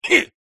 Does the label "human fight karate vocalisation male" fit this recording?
Yes